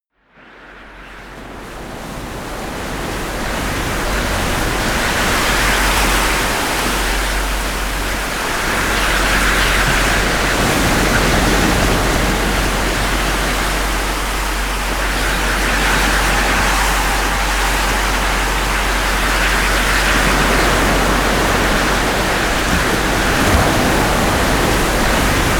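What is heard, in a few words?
heavy waves, pads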